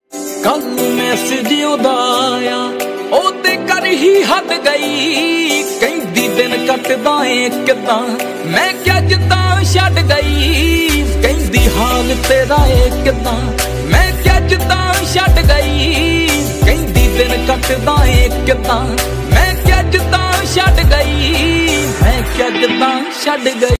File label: Punjabi Ringtone